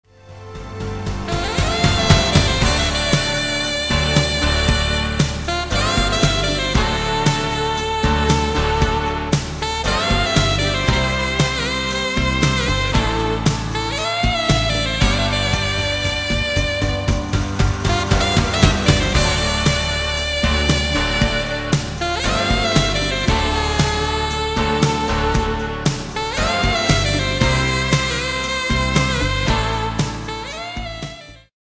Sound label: Saxophonist; Merseyside